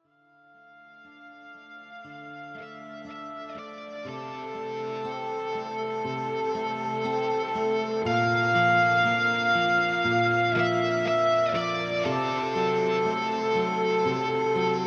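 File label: solo guitar, rock opera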